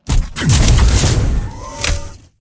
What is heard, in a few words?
aiming sfx; goliath